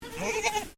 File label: Animal Goat Boer Kid Baa; Animals from Edgar's Mission farm in Victoria; Goat Sound Effects; Goats (Sound Effects:Animals); Foundation1